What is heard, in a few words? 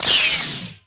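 lightsaber lightsaber hit; weapons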